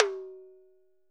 ROTOTOMS; Sonic Reality - Vintage Timetraveler

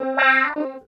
GTR

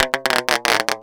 TAPE VARIA; Rarefaction; Poke in The Ear With A Sharp Stick

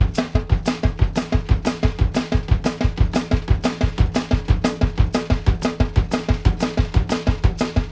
TOM TRIP; Sampleheads - New York City Drumworks VOL-1

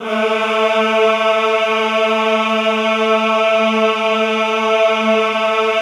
EHH A2, Classical Choir; Best Service; Extended Classical Choir; EHH MIX

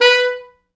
B3, Pop Brass; Best Service ProSamples vol.19; AKAI

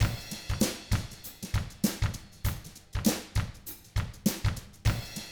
FUNKY, Drums; ILIO; Double Platinum Drums 1; 99 FUNKY 1

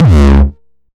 909 Dist Reso - C#2, Kicks; Drums; Mbase 11 Kicks

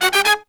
Pop & Funk Brass

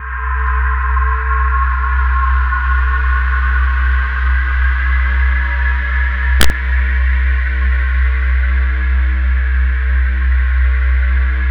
CYCLONE, CYCLODRONE; Spectrasonic Distorted Reality 1

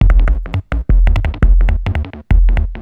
Beats, Industrial

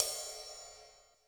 JAZZ CY RD, JAZZ KITS; Crypton; DrumBase5000

